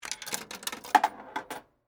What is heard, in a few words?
Pinball Machine, Technology, Pinball, Machine, Insert, Quarter, Into, Slot; Arcade; BlastWave